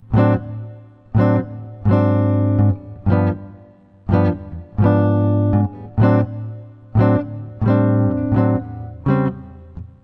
Comp Chords, jazz tunes; instructional materials

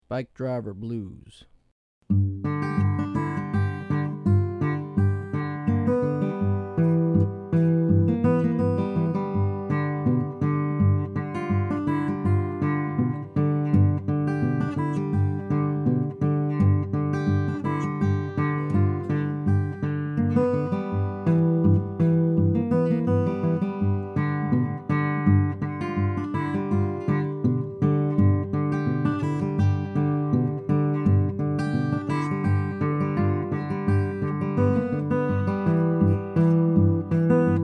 bluesroots fingerstyle

